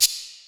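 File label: Maracas, Yamaha SY22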